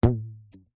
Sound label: foley elastic fabric strip ping